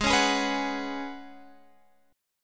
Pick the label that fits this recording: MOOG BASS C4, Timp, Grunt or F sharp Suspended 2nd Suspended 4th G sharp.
F sharp Suspended 2nd Suspended 4th G sharp